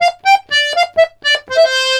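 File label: Accordions, polka; USB Soundscan vol.40; AKAI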